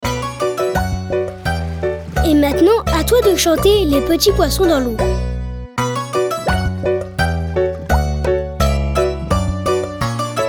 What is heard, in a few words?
Instrumental